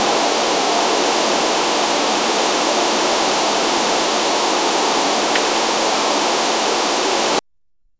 peaceful autumn afternoon

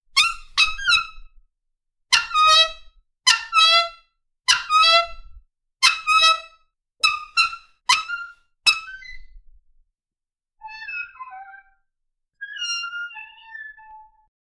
terrible larynx squeak, creature

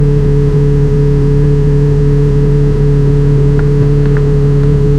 the sound of the engine, cars

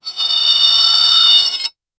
ancient lever pull sound, stone grinding

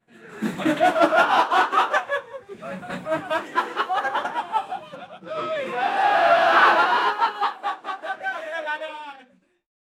congolese crowd men who l

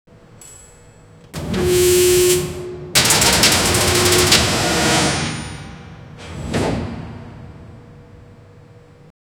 sound of a massive indust, airlock

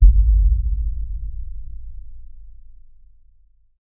cinematic sub hit with de

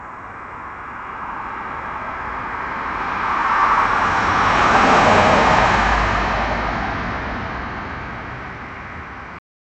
an electric car rushing p

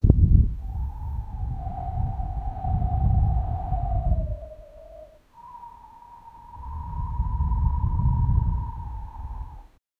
slow mountain wind; realism

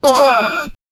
player gets hurt

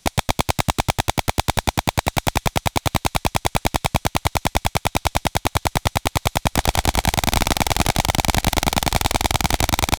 automatic ar 15 firing

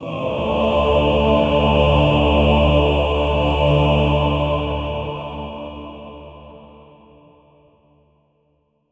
deep gothic mens choir, gothic

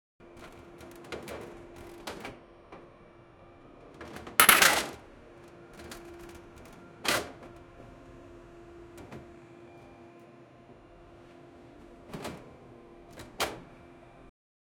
a piece of vending machin

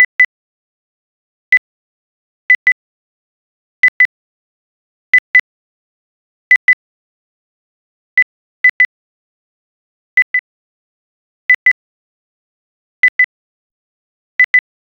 counting sound effect

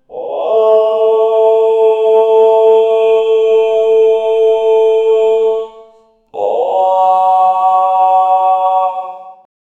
pray to azrael choirs

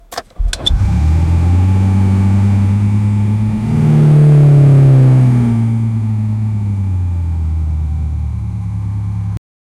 engine revs until, vehicle

